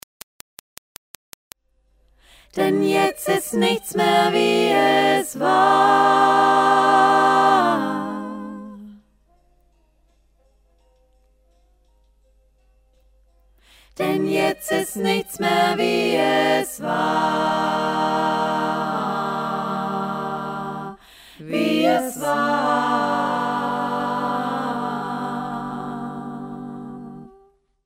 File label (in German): Chorstimmen accapella